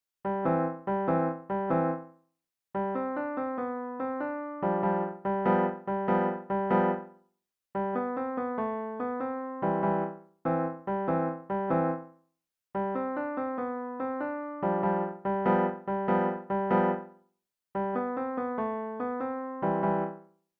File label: piano solo; mexican hat dance